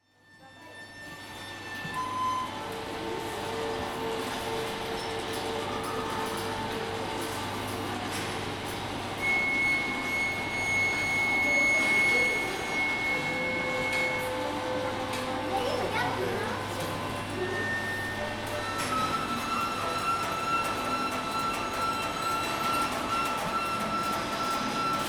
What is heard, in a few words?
Basel Tinguely Museum; MS ZOOM